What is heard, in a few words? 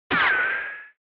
Retro Anime Gun